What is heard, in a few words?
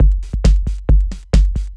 Drums; rainbow laser disco dungeon